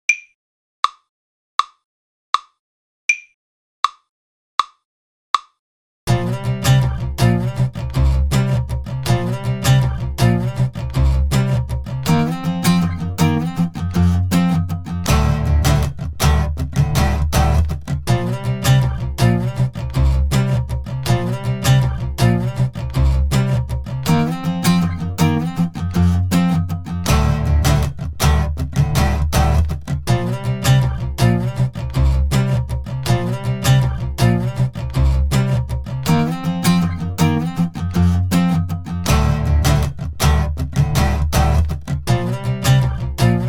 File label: Kit Solo D